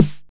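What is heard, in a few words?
snare drum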